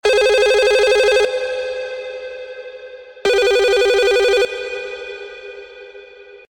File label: Echo Sms